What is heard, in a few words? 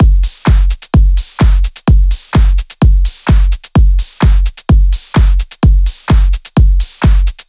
128BPM Emote Kit; Vengeance Samples